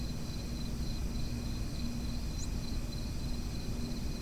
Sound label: warbler